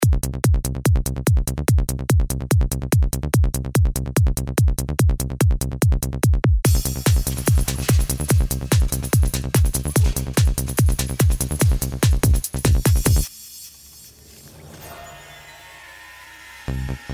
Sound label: Fat bass